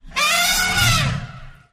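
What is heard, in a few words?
Elephant Single Short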